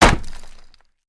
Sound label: Large Piece Wood Hitt, hits